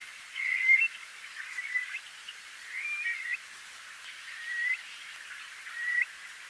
call, Stone curlew, a mix of high-frequency whistle and strangled call for the first three calls, while the remaining two are typical high-frequency whistles.; Burhinus oedicnemus